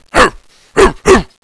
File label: dog bark; animals